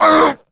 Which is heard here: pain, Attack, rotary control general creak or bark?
pain